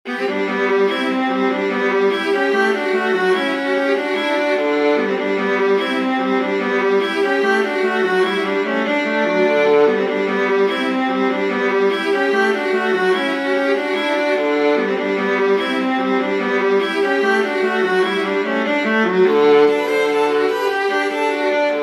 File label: gigue angloise major for viola duet